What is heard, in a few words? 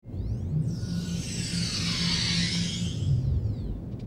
replicator